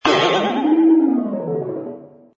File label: Launch; conservation catapult; project green